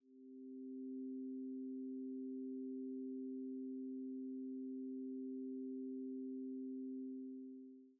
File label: victory theme; princess lima